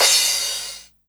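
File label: cymbal, dance drums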